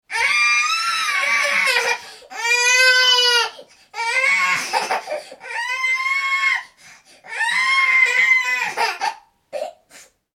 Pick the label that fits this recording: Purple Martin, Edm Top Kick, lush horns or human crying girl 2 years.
human crying girl 2 years